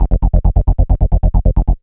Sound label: e pulse g4; DirectX SDK; DMusProd